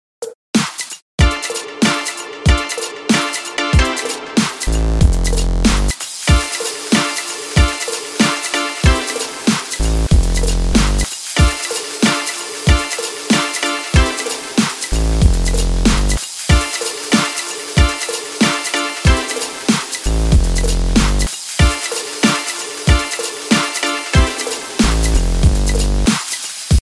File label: beat